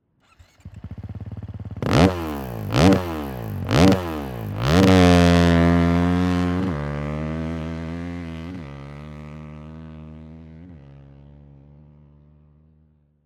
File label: KTM EXHAUST 350 AKRA